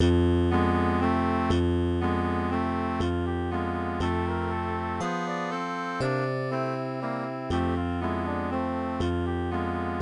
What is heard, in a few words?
Zither Carol